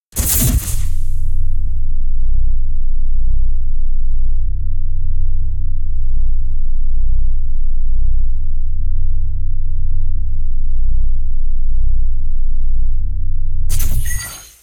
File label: Future Weapons - Shield